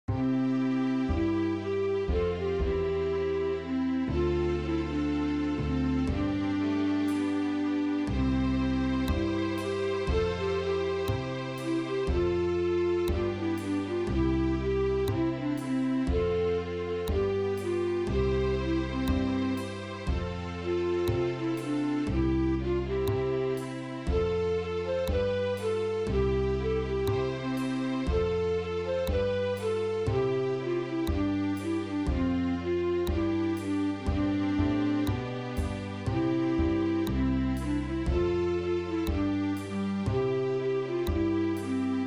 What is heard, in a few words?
instrumental